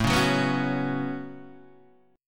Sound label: A 6th Add 9th A down Guitar Open D